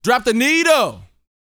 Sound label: VOX F, Vocal Planet R&B & Dance HIP-HOP; ILIO